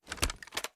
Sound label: reload empty fast drum end; gmod